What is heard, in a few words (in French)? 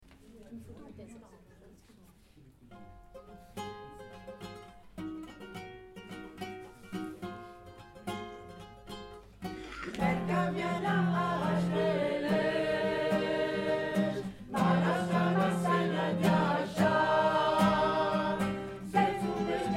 Chant ingouche